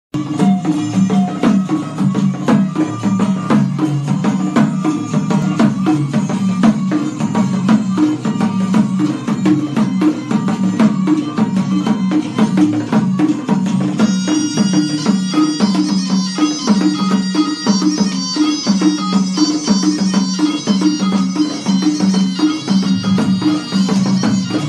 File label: deserkoten